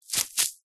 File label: office sponge brush wet paint squeeze fingers; zapsplat